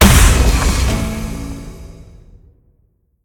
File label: impact; smite; gilgamesh